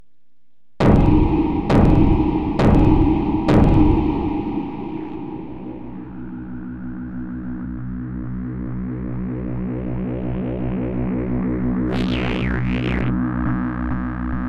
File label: drone; apathy engine